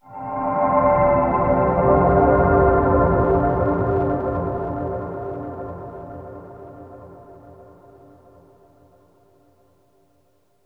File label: Tonal FX Dm 90 Bpm, Granular Tonal FX, Lo-Fi Kit; HipHop; Ghosthack; Advent Calendar